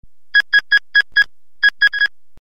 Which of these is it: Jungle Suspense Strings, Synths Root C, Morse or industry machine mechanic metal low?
Morse